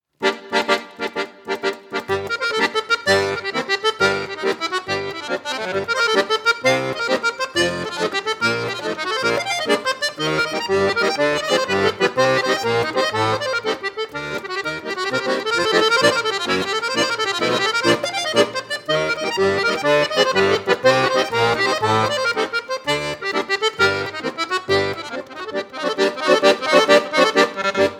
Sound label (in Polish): Akordeon; Akordeon-Kornel